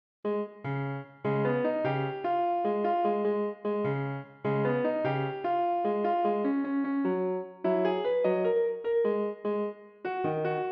Rhythm

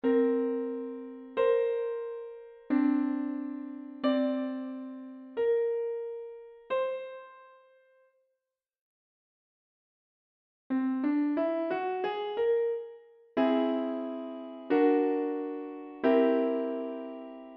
Minor 7, jazz piano

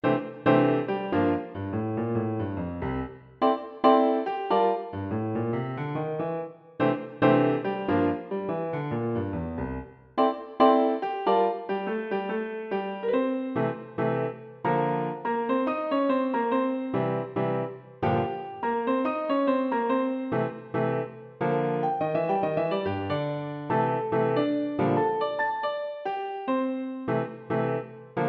New Rumba, Jazz For Piano

